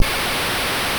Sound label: static; An Ordinary Sonic ROM Hack; Sega Genesis